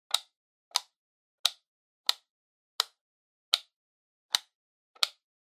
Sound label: Outlet Timer Pin Push; タイマー機器